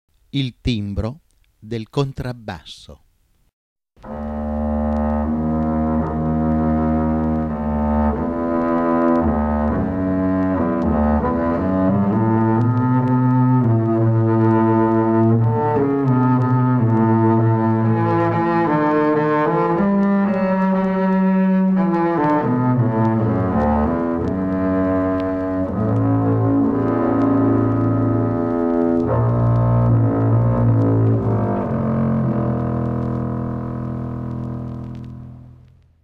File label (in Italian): Contrabbasso, il timbro del contrabbasso